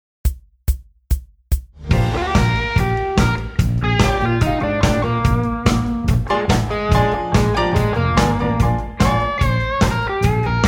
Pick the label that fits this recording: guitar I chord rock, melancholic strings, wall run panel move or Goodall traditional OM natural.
guitar I chord rock